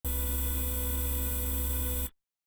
robotic arm medium; soundscrate

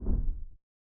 Horror Soft Thud; SoundsCrate

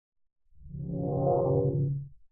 metal swing; soundscrate

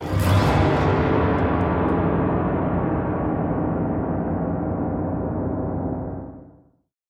horror terror hit; soundscrate

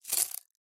Leaves and Twigs Crunching; SoundsCrate

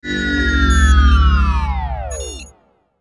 Sci Fi Power Down; SoundsCrate

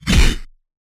Anime Super Whoosh Punch

